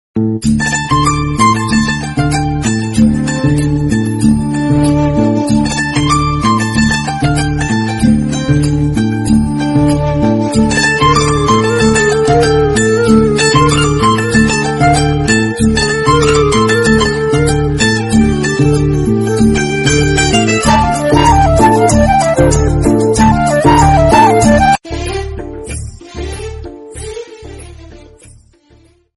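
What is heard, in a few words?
Tamil songs background music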